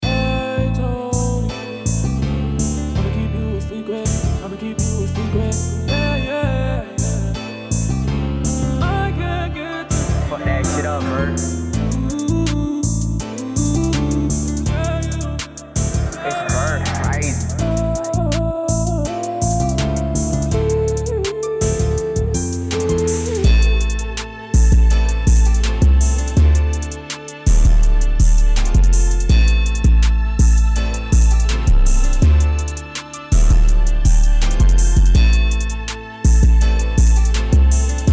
retro beat 164 a minor

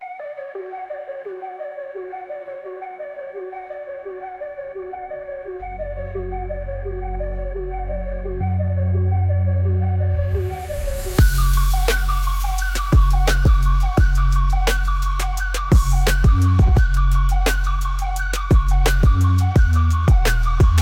aggressive beat hip hop

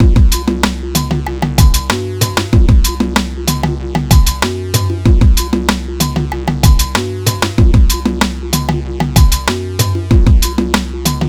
the type beat

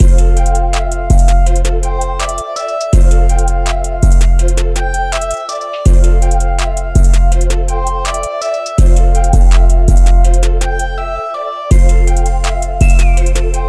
F bpm164

